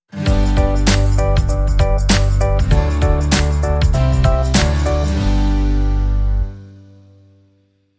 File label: Stinger